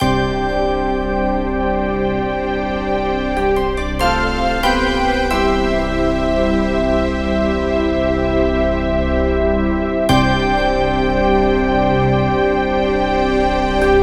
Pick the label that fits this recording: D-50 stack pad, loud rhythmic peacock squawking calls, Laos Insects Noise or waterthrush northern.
D-50 stack pad